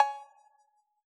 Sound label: analog cowbell; AnlgKit1